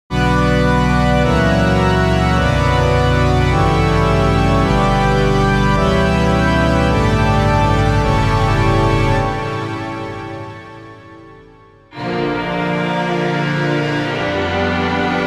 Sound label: Chords Classical